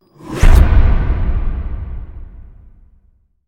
Scary ghost impact effect; DsoundE; House of Horrors

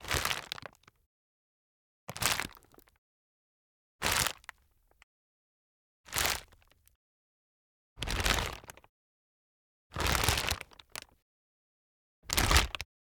Plastic Bag Thick - TWIST - Burst - Low Intensity; Pole Position; The Plastic Textures Library

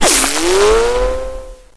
near miss, weapons

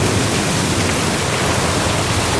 storm, rain; nature; hl2

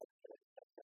Spaceship Interface; Membrans; Spaceship Interface Sound Pack 01

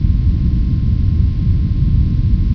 room tone; hl2

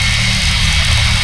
cbot energyball loop; hl2; combot

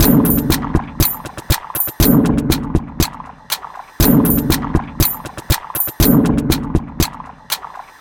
Designed Loop Scene Rhythmic Tension 120 bpm; MatiasMacSD; OPEN CONTRACT

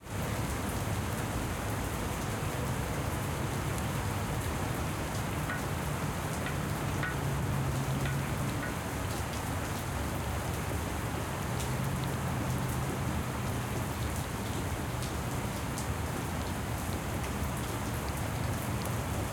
Rain On Concrete, Drips On Metal; 5.1 LCRLsRsLFE; 2496SoundEffects; Surround The Rain 5.1- Stereo

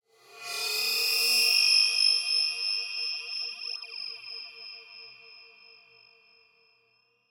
Bowed Alien Metals; 344 Audio; Cymbals From Hell

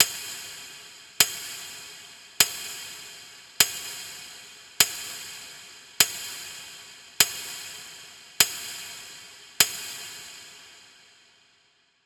Clock Tick Clock Multi Reverb; InMotionAudio; Sinister Textures 3

